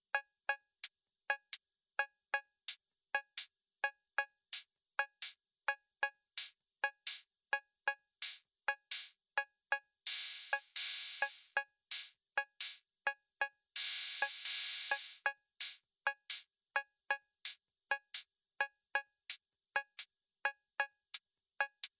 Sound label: DBI Cowbell Cymbal